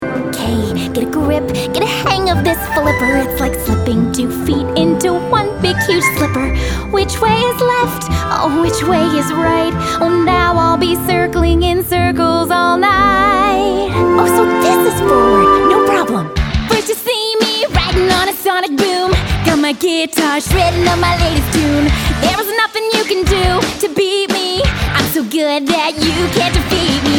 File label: Character Singing Remix